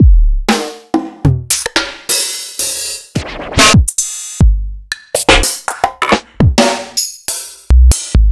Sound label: EMU Proteus-2000; m8